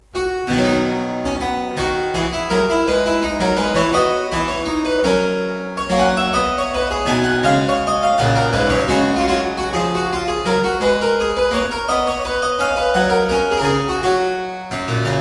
Suite no 11 in C Major - Courante, spoken; Johann Mattheson; The Twelve Suites of 1714

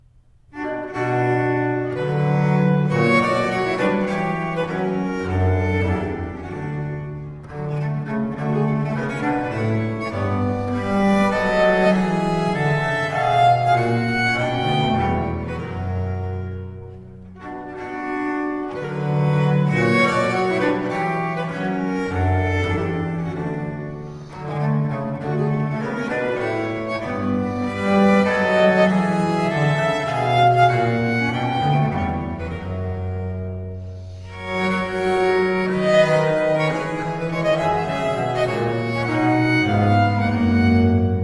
Suite in C Minor Courante, Viols; from The flat Consort; Matthew Locke; Wildcat Viols; Fairest Isle

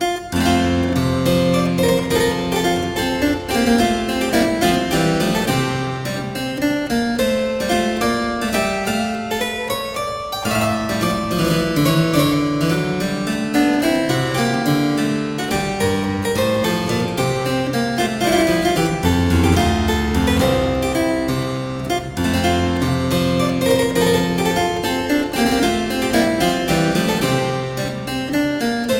Suite in G major Gigue, Harpsichord; Jacques Champion de Chambonnieres

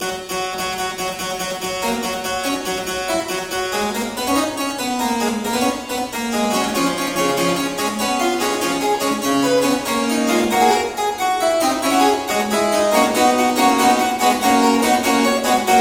Fughetta for organ; Dies sind die heilgen zehn Gebot; BWV 679; Johann Sebastian Bach; ClavierUbung III

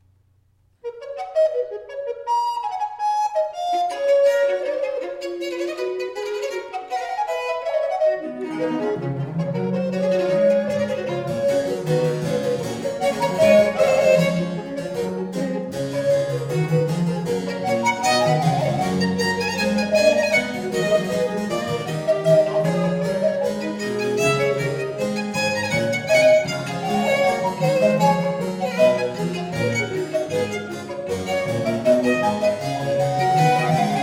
Fuga Pretissimo in G Major, violin; Ayres for the violin Book 3; Nicola Matteis

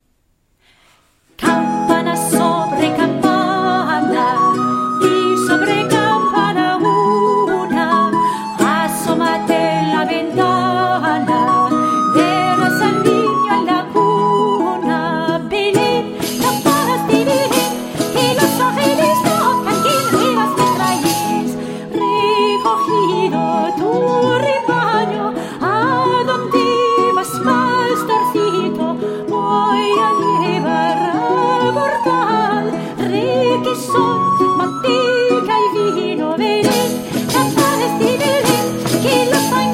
Villancicos for Christmas; Campanas de Belen; Spanish and Catalan Folksongs

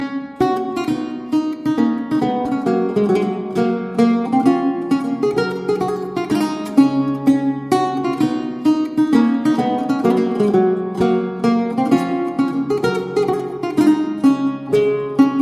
Gavotte; Ludovico Ronacalli

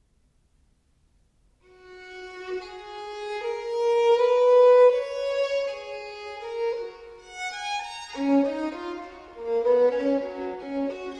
A Prelude for the Violin

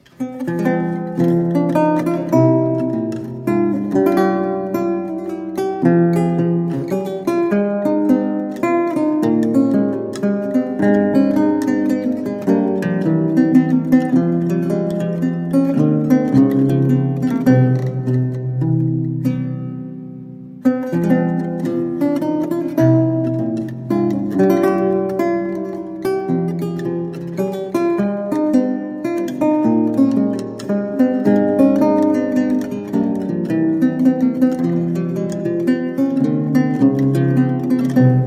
Courante in F Minor; Johannes Fresneau

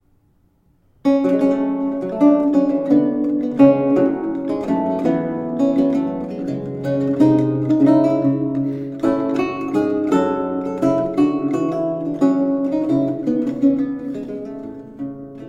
Courante Suedoise in G; Francois Dufault; La Superbe; Thomas Walker

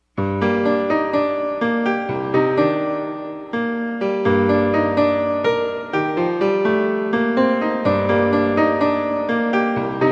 Prelude in G Minor, Solo Piano

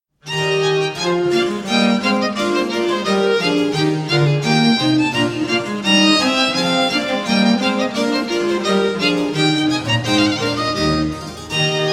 Gavotte (Alla breve), spoken; Mensa sonora Pars V (C 73); Simplicissimus Ensemble

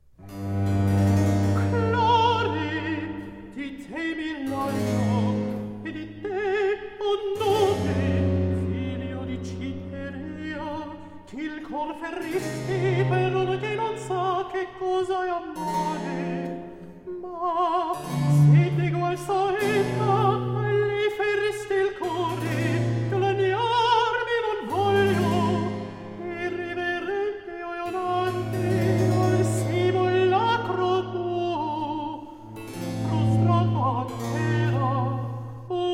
Cantata for alto flute continuo Adagio; Mi palpita il cor HWV 132c; George Frideric Handel

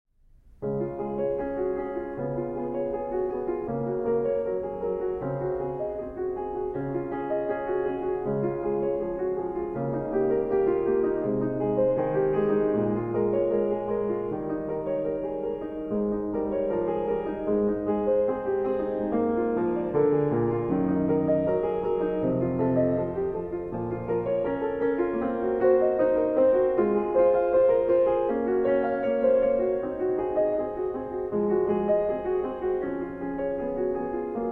Praeludium, C-Sharp Major, Well-Tempered Clavier; Prelude And Fugue No 3; BWV 872; Johann Sebastian Bach; Book 2

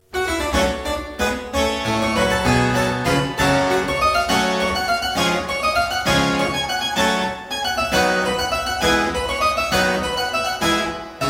Suite no 4 in G Minor - Gigue, spoken; J Mattheson; Johann Mattheson - CD1 - The Twelve Suites of 1714

